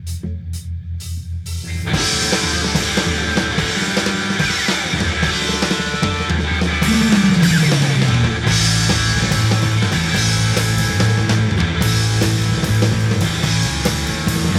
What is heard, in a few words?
Drum Solo, Live